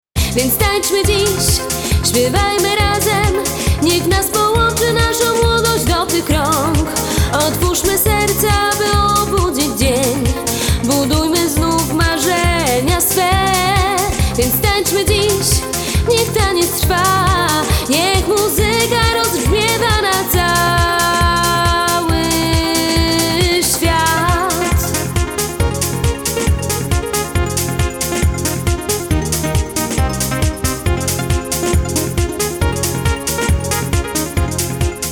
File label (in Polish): Hymn mlodosci